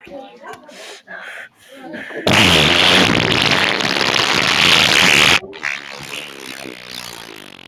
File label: fart noise fart